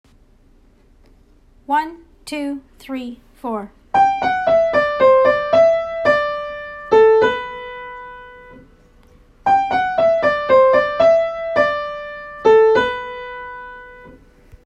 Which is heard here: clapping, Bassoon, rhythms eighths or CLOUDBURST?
clapping